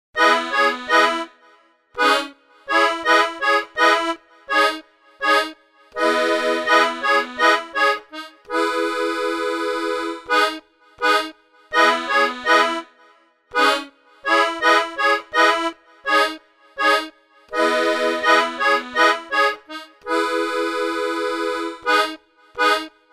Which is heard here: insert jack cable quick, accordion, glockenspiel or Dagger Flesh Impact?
accordion